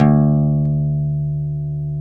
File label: PLK OUD D; Roland - LA Composer vol. 3